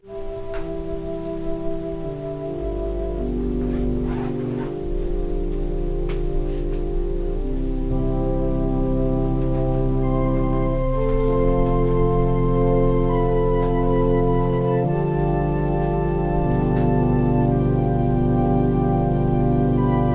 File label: Organ prelude; A burning heart